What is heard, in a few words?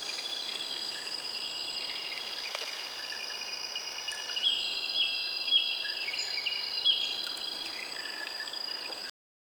meditation